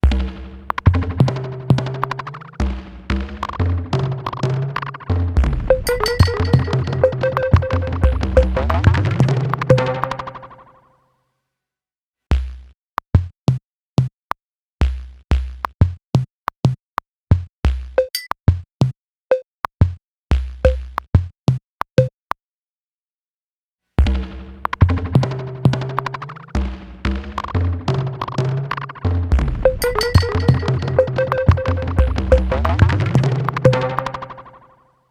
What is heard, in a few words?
Drum Machine Old Vinyl; H90 Programs